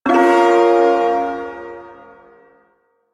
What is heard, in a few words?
Ersatz Shawzin Hirajoshi Chord; Warframe; Duviri Ersatz Shawzin